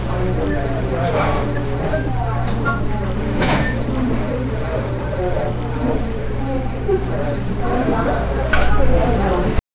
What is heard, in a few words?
hotel ambience crowd nois